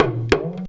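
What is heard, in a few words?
small tama hit; Best Service; World Colours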